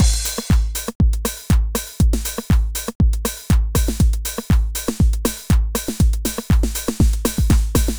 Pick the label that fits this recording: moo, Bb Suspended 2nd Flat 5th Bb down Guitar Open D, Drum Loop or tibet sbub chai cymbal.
Drum Loop